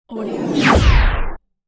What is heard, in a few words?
laser needle